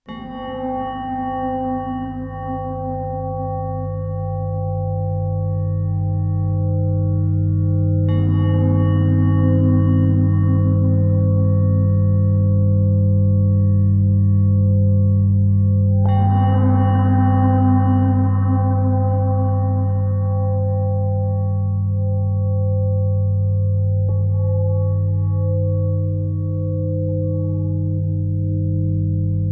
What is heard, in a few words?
Ambient Mix, Acoustics